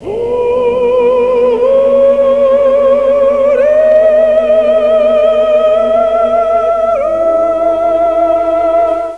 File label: chimes